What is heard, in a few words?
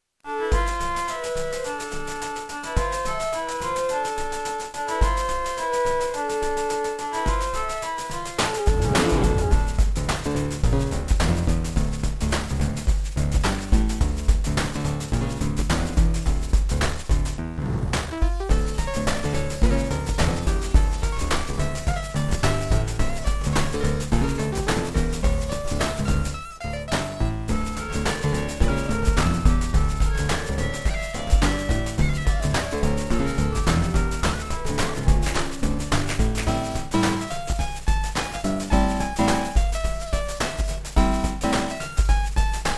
Beepblaster 2.0 SYNTHMODE; Lost Vikings